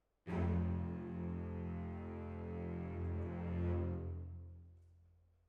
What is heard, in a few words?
Hall